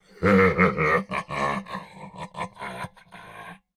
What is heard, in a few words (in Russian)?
Смех; Турбосвин